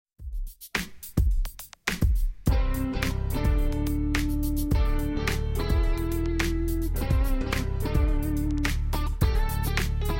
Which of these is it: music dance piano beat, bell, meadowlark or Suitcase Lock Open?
music dance piano beat